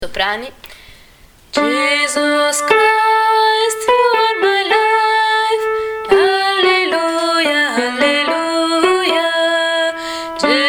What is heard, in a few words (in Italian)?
soprani